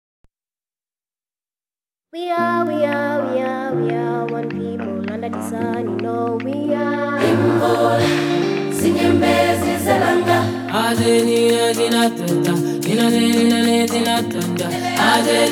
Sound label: Youth Choir, Rain